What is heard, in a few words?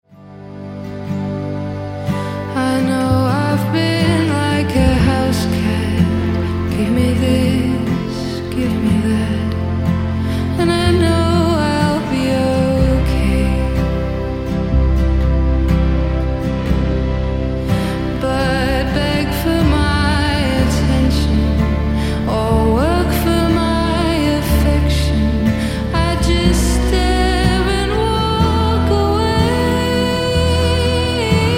chorus